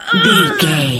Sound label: voice female hurt pain